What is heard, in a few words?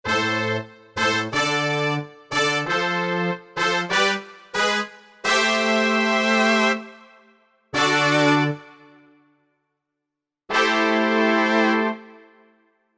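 Brass Stereo, Korg MSC-9S Organ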